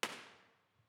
IR petardo orchestra; Teatro Benevento

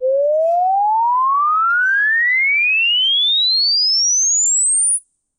sweep 500 10k 5s trombino esterno dritto; CESI

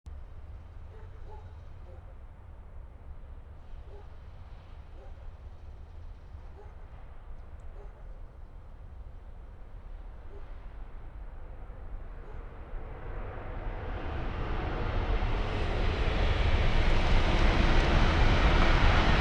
Treno; Eigenmike; mono 16bits; Nuova Strada; ComunePR

